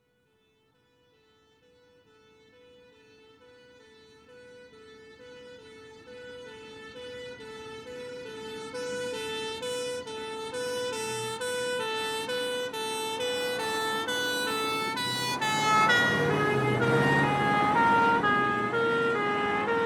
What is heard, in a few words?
Fire Engine, Pass R To L With Rev; Emergency; BBC Sound Effects Library